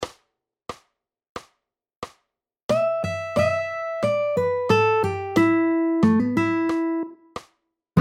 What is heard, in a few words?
Pentatonic Riffs